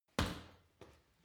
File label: punching bag kick medium